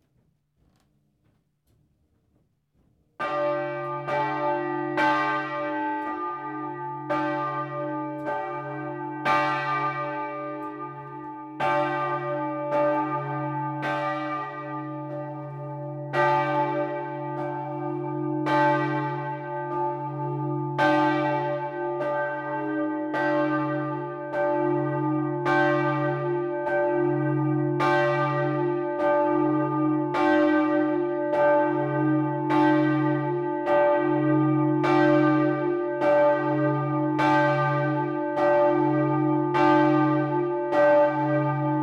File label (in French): cloche; Knutwil cloche-1; Assemblée générale 2013 de la GCCS à Willisau (LU)